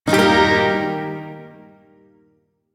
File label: Shawzin Blues Chord; Warframe; Duviri Shawzin